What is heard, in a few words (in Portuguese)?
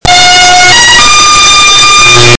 Toque simple